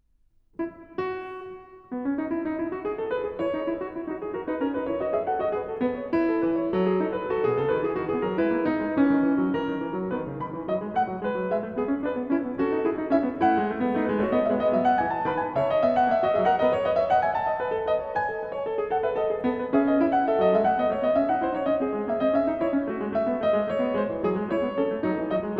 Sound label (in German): Fuge E-Dur; BWV 854; J.S. Bach; Das Wohltemperierte Klavier Book 1